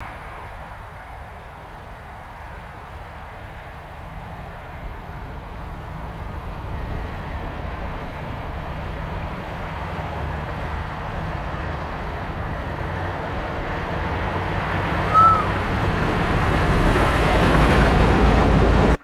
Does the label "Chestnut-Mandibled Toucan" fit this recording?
No